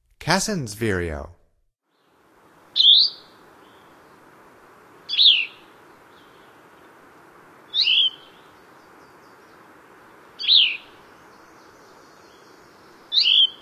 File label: Cassins Vireo, Songs of Birds of North America; Stokes